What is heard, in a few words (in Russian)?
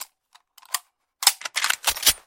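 установка прицела на винтовку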